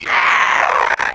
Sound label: mutant runner die